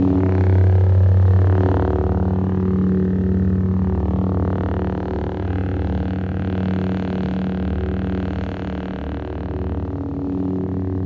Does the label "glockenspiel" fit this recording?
No